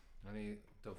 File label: Bass Room